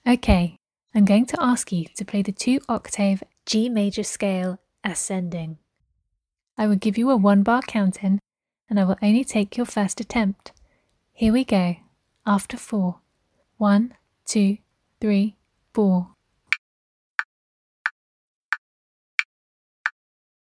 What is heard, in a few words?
G major ascending